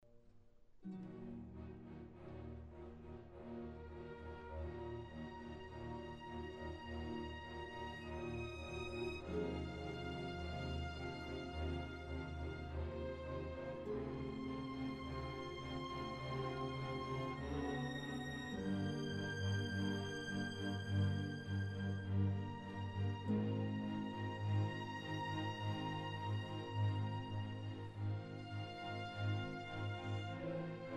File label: 2nd theme violins